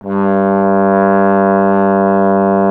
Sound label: Trombone G; Miroslav Vitous - Solo Instruments VOL-1